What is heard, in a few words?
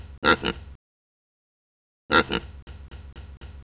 pig